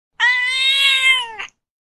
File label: CAT MEOW SOUND; memes